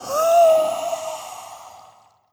bat; GammaAge; creature

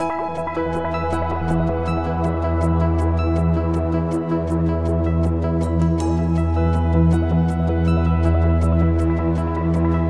Ambient Joy; NWNDS; Ambient music pack

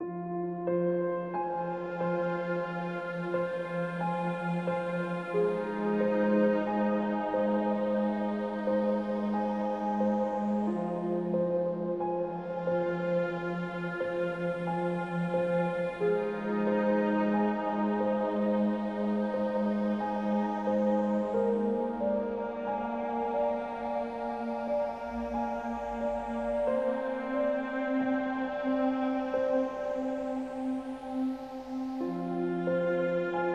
Ambient Sadness; NWNDS; Ambient music pack

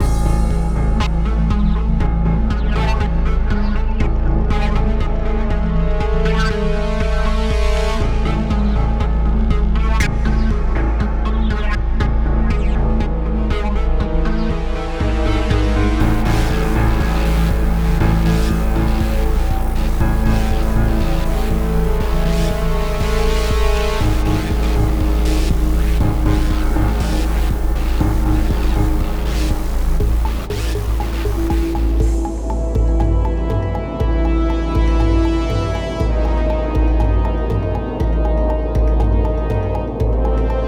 Ambient Fight; NWNDS

